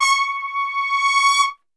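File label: C#4 trumpet, Brass Super Section; Best Service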